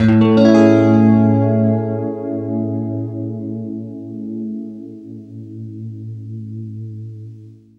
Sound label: FLESH CHORDS, Guitar